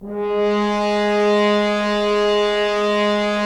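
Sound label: Solo French Horn, brass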